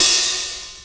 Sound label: HH OP, Drum Dry; East Collexion; Drum 1